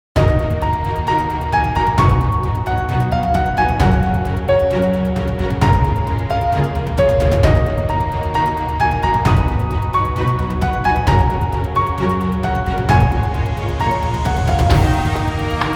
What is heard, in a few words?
bgmusic